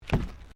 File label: Footsteps Decking Wooden Step